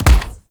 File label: Weapon Punch Bow; Warframe; Weapons; Bows